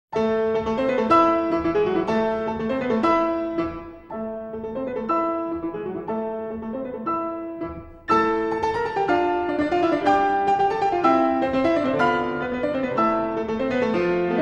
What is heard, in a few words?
Piano; Brahms Paganini Variation